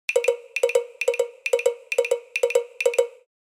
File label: Cartoon running horse